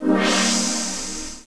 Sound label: swish